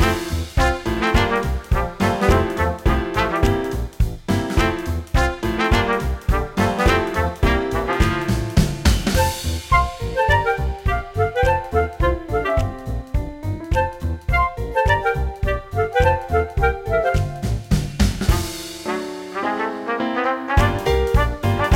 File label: theme; The Smurfs - Penalty Shout-Out